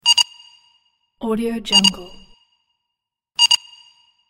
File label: radar ping